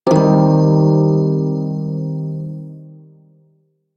Prime Shawzin Blues Chord A; Music; Warframe

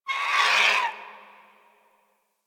Zone Tree Critters; Ambience; One Offs; Warframe; Eidolon Plains

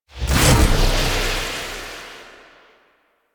Void Mode End; Weapons; Warframe; Duviri; Incarnon Adapters

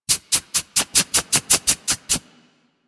foley idle scratch; Enemies; Warframe; Catbrow

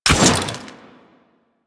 Quill Dart Gun Mech, Weapons; Warframe

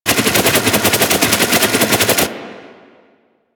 Boomerang Loop, weapons

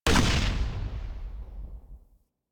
Launch, Rocket; Warframe; machines; Jackal

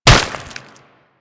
Golem Gun Projectile Hit; Warframe; Attacks

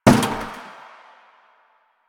destructible bucket impact

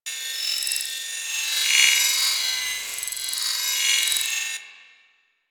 Pickup Loop; Warframe; Dark Sector

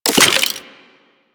Bubblegun Pistol Reload; Warframe; Weapons; Tenno

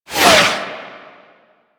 Tank Missile Barrage Whizzby; Enemies; Abilities; Warframe; Lasrian

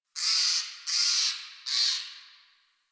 Tree Critters; Warframe; Duviri

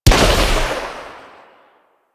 Arch Rocket Crossbow 2D Shot, Weapons; Warframe; Archwing

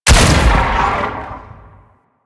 Rail Jack Rifle Secondary Shot; Warframe; Tenno

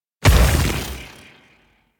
Iron Blast Proj Explosion; Warframe; Warframes

